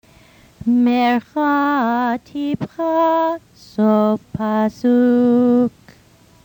Haftarah Mercha Tipchah Sof Pasuk

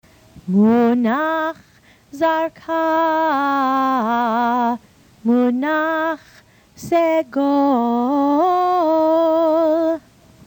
Haftarah Munach Zarkah Munach Segol

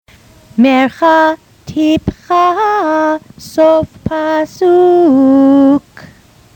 Torah Mercha Tipchah Sof Pasuk

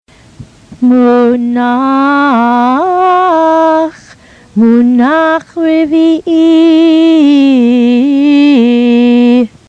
Haftarah Munach Munach Rviee

